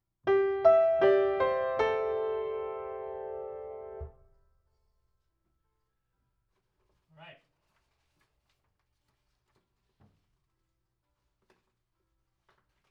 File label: Piano Ribbon